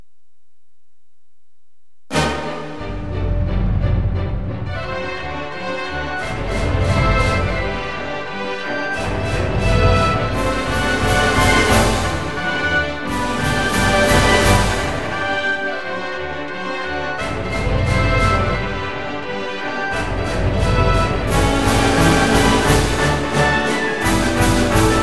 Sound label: thunder; strauss